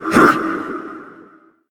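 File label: attack vox; gundyr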